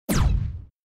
Laser fire; شلیک لیزری

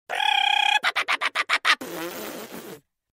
to be ridiculed

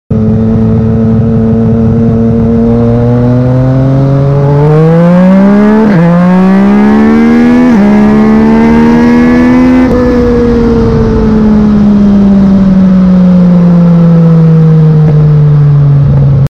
Acceleration

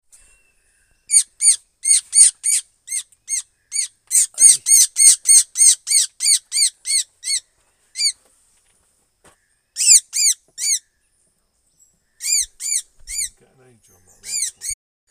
Buff throated saltator

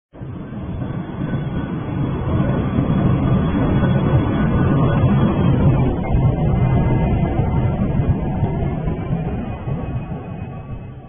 Concorde; هواپیما کنکورد

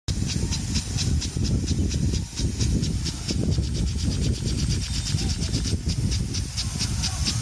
Watering plants

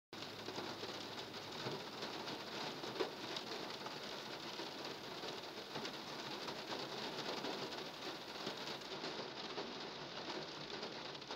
Rain inside car

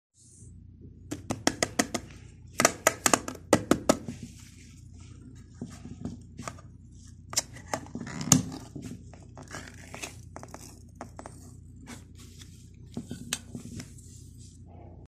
canned, باز کردن کنسرو

